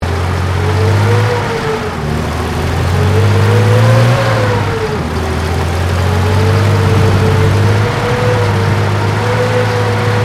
TANK CENTURION MANOUVER HILLS, British Centurian main battle tank; Truck Sound Effects; Sound Effects:Vehicles